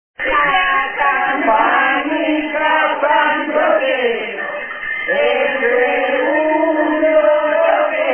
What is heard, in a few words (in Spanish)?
audio campanica; s.roque; bordon